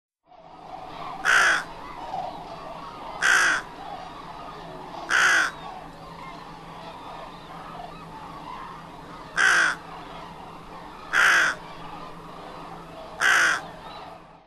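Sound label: Rook, izumi; miyamagarasu; koe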